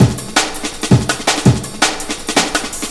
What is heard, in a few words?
Voyage Break, Breaks, Jungle; Blu Mar Ten - Jungle Jungle - 1989 to 1999 Samplepack